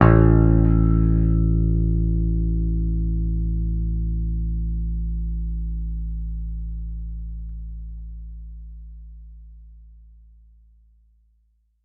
Acoustic Guitar Pluck - A; Instruments; Guitars; Cymatics; Light Free Sample Pack; Bonus Samples

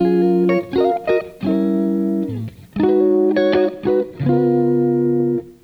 Electric Guitar - 85 BPM F# Maj, dry; Melodics; Cymatics; Eternity Sample Pack; Guitars - Electric

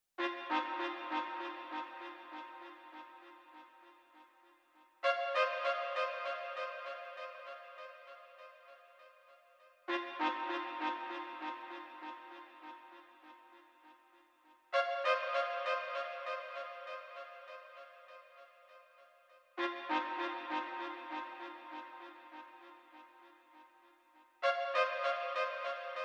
Brass, 99 BPM A# Min; Loop Stems; Cymatics; Infinity Melody Collection; Feeling Good

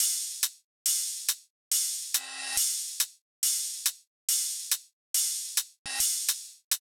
Riddim Drum Loop Top (140); Drum Loops; Ghosthack; Riddim Essentials 2020

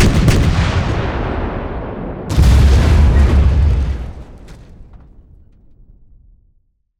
Explosion Series

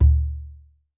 Bobobo - D; Percussion; Cymatics - Infinity Beta Pack 2.0; Zeus Percussion Collection

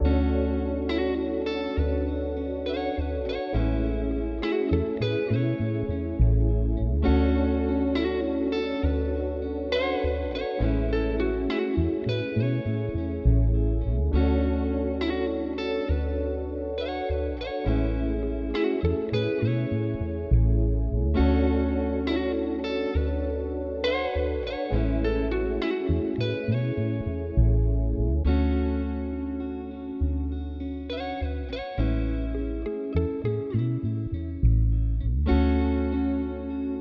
68 BPM A Maj, Guitar; Melodies; Avenue

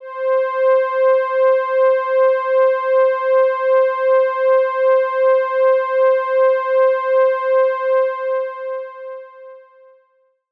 PAD - C, Synth One Shots

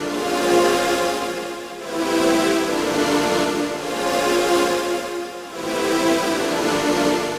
Pad Loop 130 F, Techno; Loops; Pads; Ghosthack; Free Techno Sounds 2024

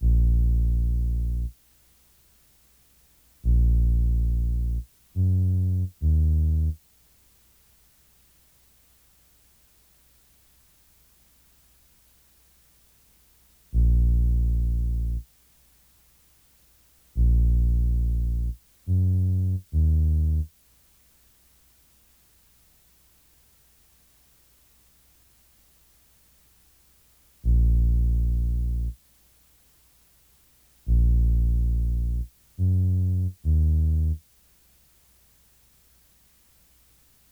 140 BPM A# Min Bass; Loop Stems; Cymatics; 2022 Melody Collection Platinum Expansion - Pt 2; Plug

